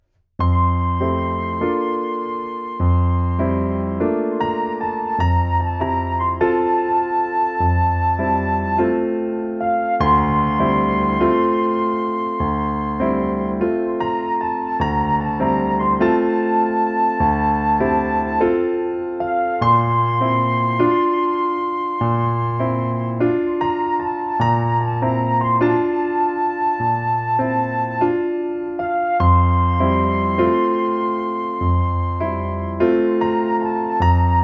Flute; Phoenotopia Awakening; Panselo

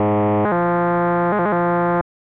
Classic Vine Swinging; Pitfall The Mayan Adventure; SNES

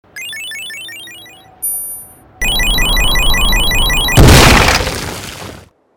Warp Star; Fleeing The Comples

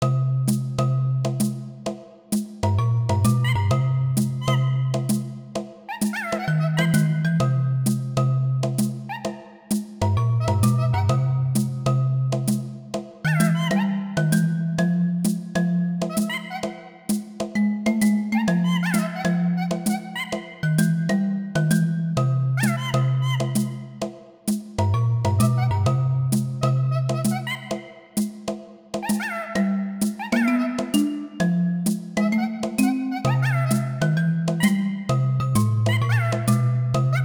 Pause Menu; Donkey Kong 64

